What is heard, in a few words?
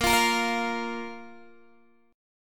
Bb 5th Bb Guitar Open D